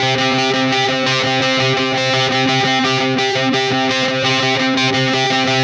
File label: Static FX Guitar